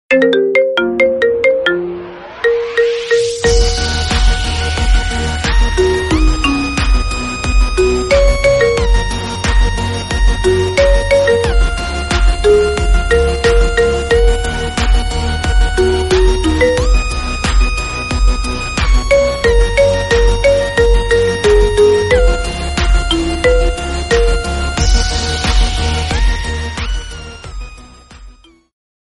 nhac chuong dien thoai marimba remix

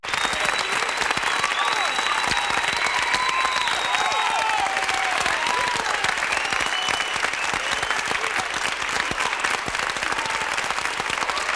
tieng dam dong vo tay co vu

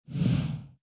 tieng am thanh chuyen slide; chuyen canh hoat hinh vui nhon

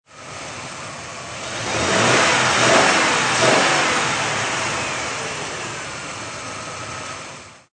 tieng xe o to tang toc

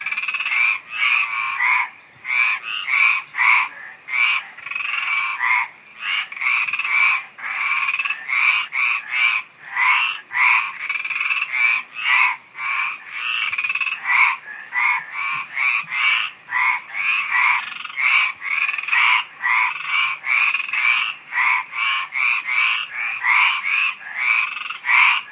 tieng ech ngoe keu trong dem

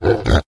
am thanh lon ngan

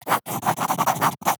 ký chữ ký bằng bút máy nhanh

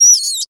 tieng rit cua doi

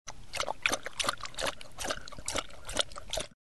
chó uống nước

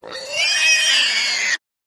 am thanh lon keu

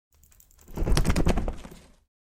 am thanh cua canh doi

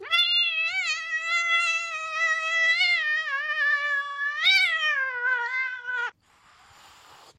am thanh cua mot con meo hung du gian du

